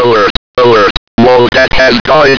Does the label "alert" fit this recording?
Yes